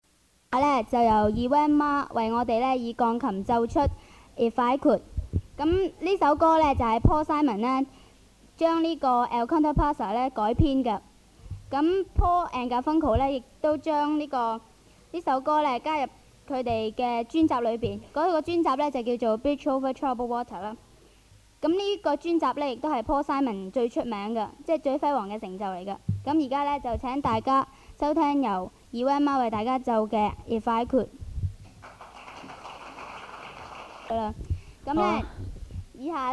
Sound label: piano